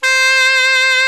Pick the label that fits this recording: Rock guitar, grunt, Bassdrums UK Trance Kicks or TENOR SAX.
TENOR SAX